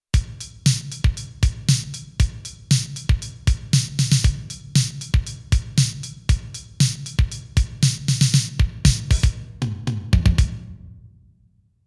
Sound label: Sony DRE 2000 Wersi EX10R Drums Reverb